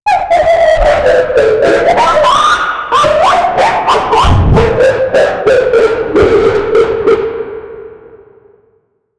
single water drip in a cave

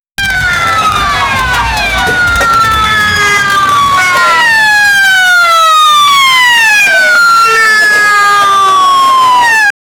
police

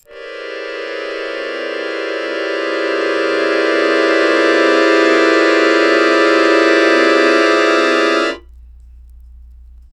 a fast accordion tone

